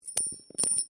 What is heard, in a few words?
Coin Penny Multiple Fall; Common coins; Money; Objects; SoundEffects Foundation2